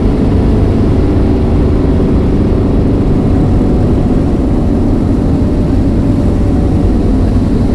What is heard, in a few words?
fourth shift accel down, car; vehicles; garrysmod; sincar